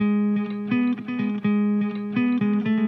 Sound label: guitar sharp; Loops